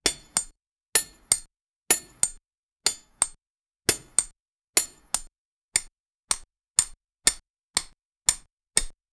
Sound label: sound of impac, action